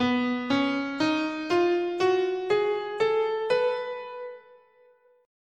B Lydian Dominant, scale

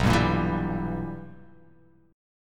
B Major 7th Suspended 4th Sharp 5th, chord

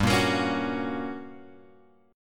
G Minor Major 7th Flat 5th, G; GmM7b5 Chord {3 1 X 3 2 2} strummed

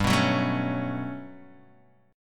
G Major Sharp 11th G down Guitar Open G

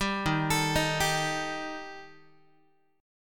D sharp Major Flat 5th, Guitar; arpeggiated; D#Mb5; 10,11,12,13,11,x; JGuitar

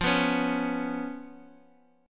B Suspended 2nd Flat 5th F

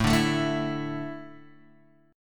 A Suspended 4th, down, Guitar, DADGAD

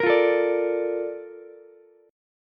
A sharp Major 7th, Ukulele; A# M7 strummed; 15,17,17,17

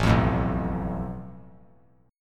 A Suspended 2nd Sharp 5th; Asus2#5; strummed; 9,12,12,9; 3C,3F,3A,3D; JGuitar

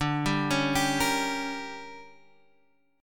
D Major 7th Suspended 4th Sharp 5th, chord

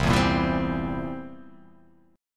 C 7th C down, C7 chord; strummed; JGuitar

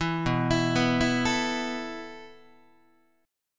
B 7th Suspended 4th B down Guitar; B7sus4; arpeggiated; JGuitar

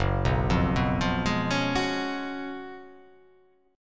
F sharp Major 7th Suspended 2nd Suspended 4th; F#M7sus2sus4 arpeggiated

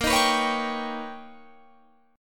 B Augmented Major 9th A sharp, chord

